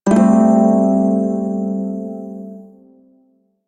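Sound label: Prime Shawzin Major Chord K; Music; Warframe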